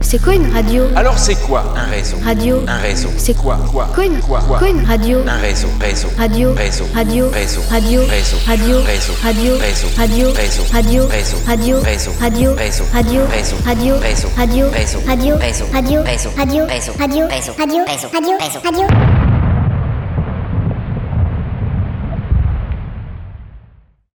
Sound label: jingle; rezo; interludes jingles pour la radio